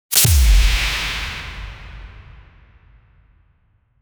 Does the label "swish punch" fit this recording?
Yes